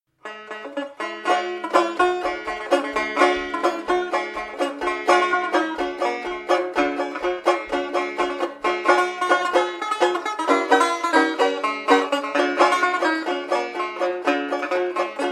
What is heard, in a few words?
steel guitar